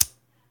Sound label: Lighter flick